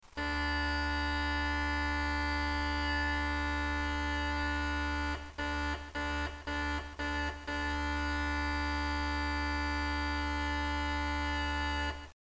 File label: maple drones, uilleann